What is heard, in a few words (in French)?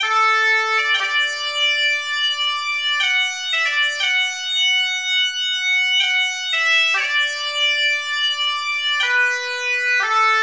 cornemuse; amazing grace pour cornemuse